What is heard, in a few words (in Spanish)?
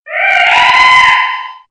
Grito